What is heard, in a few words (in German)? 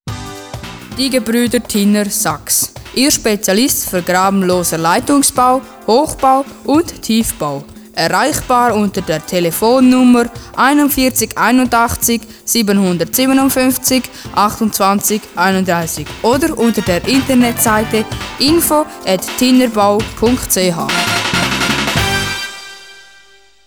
Sax